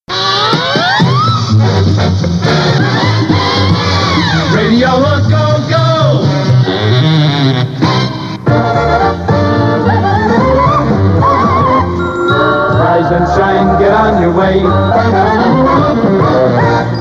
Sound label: Jingles